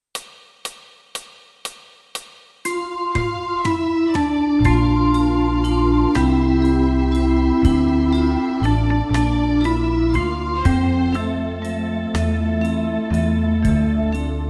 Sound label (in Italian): Organo